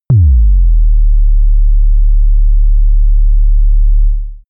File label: sub kick sustained